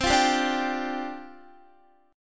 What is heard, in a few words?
C Suspended 2nd Suspended 4th, down, Guitar